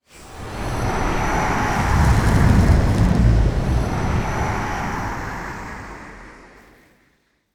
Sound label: boss, monster